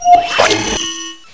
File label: item drop; nst zombie